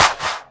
Claps; Vengeance; Essential Clubsounds Vol.4

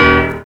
PIA, PIANOS; USB Soundscan vol.02; AKAI

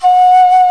WINDS & BRASS

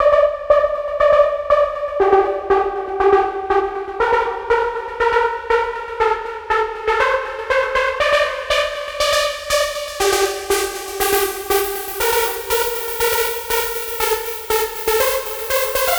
Trance Synth

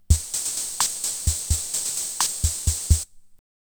SLOWROCK, Eminent Solina Rhitmix

